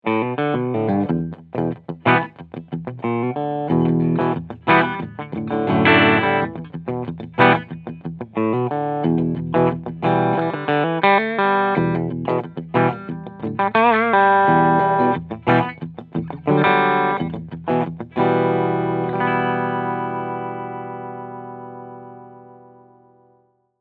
Ibanez AM93ME NT Cleansound Steg PU

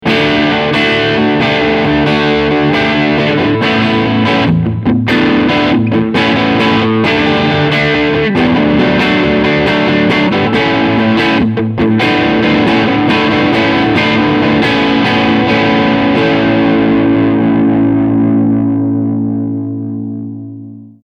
Crunch beide PUs

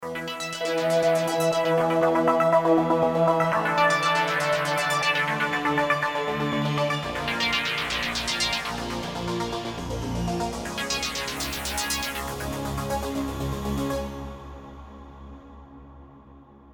Keys Oberes Band Boost Und Cut

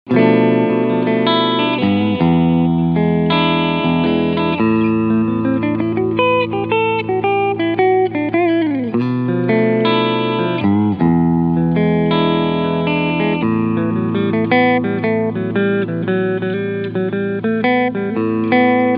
PRS SE Hollowbody MT McCarty TSB Cleansound beide Pickups

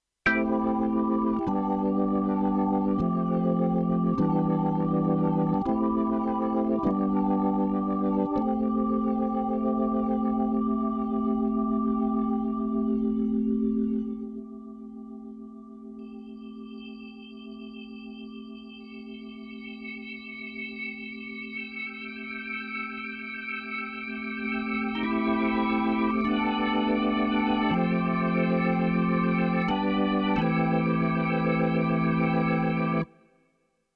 BK9 Orgel Drawbars

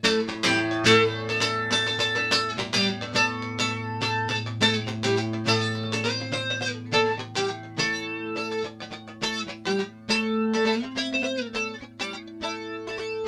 Flanger Gitarre Deep Flange

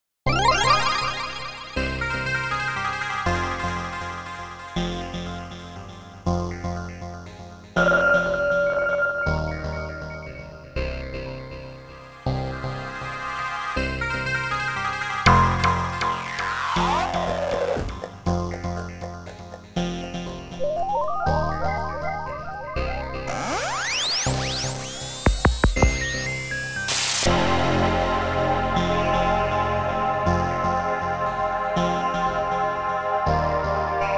Overture ohne Reverb; Marienberg

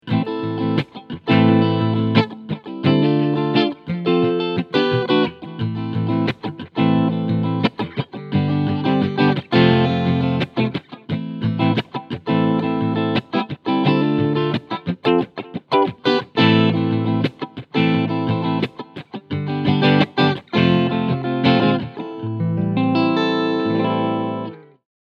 Schecter C 1 EA Classic SVPB Cleansound beide PUs SC Modus

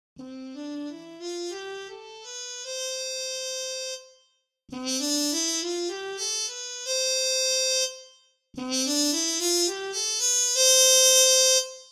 Trompete Harmon

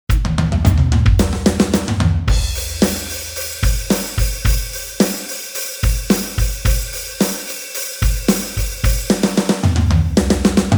Stil Rock

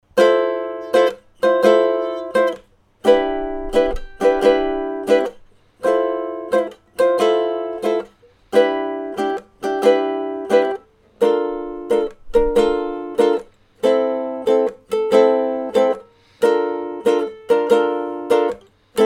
Apogee Ukulele nah mit Popschutz

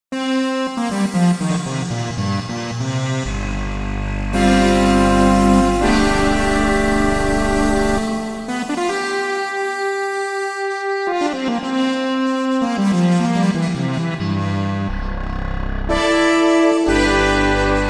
fett

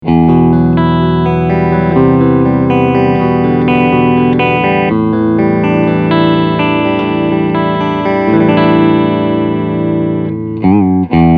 Clean beide SC

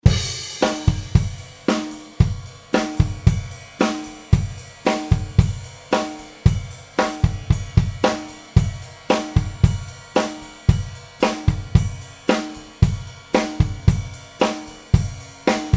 Drawmer 1972 Drums dry Bounce Pan Mitte

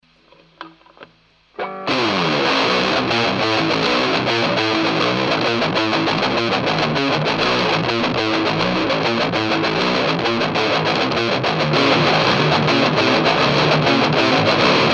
Cort Steg Pickup zerre

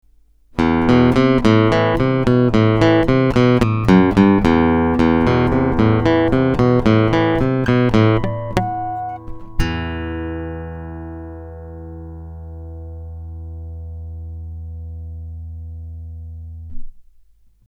Akku

